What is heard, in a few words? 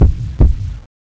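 heartbeat; zoochosis panda; zoochpanda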